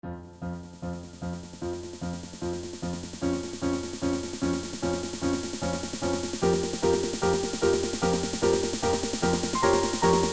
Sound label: Techno Remix Classical; vivaldi